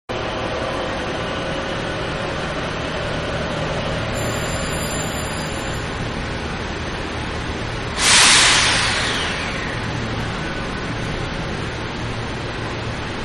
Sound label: Diesel Train Departing, Train-Commuter-Departing-Beginning Of Track; Trains